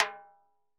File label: Timbaleta Drum Rimshot; Best Service; Festa Latina VOL-1; Single-Hits